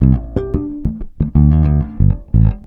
ACID JAZZ

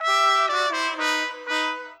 Trumpets, Orchestral; Sonic Foundry (Sony Creative Software); Orchestral VOL-2; Modern 35

